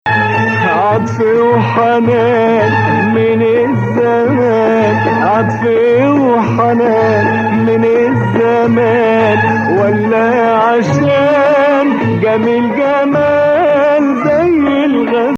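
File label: Nawa Hijazkar, Arabic music; Maqam Nawa Athar; Hijazkar 5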